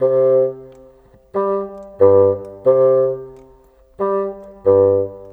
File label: Bassoon, Cinematic; Sonic Foundry (Sony Creative Software); Orchestral Series VOL-3; Cinematic 24